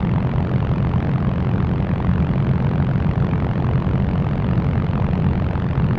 Aircrafts Engines Rotor KA50 Noise; DCS

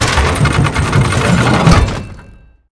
damage; World of Tanks

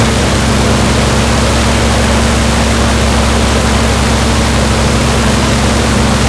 bomber side, engines; War Thunder

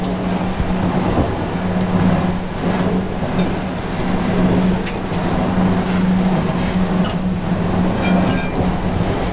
Vehicle Weapons; Red Orchestra 41-45

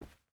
footstep boots cloth walk; Rust

